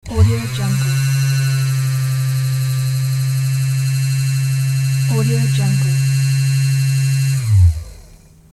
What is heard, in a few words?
servo grannys chair; hollywoodedge